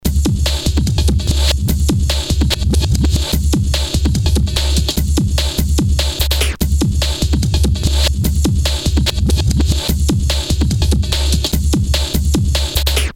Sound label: Beat